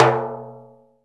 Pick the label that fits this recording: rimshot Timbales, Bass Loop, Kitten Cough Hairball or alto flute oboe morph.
rimshot Timbales